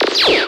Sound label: ray gun ray gun